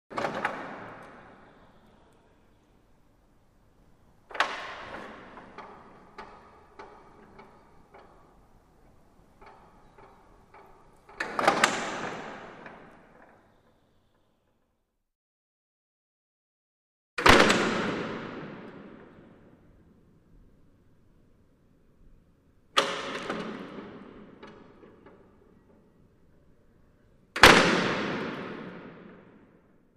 Metal Gate; Medium Sized, Various Opens And Closes, Reverberant Interior, Medium Perspective